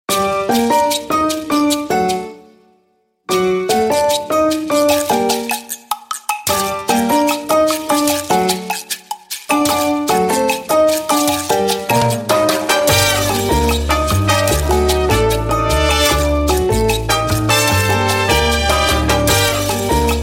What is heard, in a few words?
Nokia Lumia